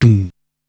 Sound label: "Tuunn", Korg Wavestation-SR Drum Kit